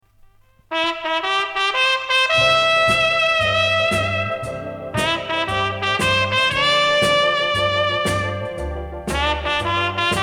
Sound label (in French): orchestre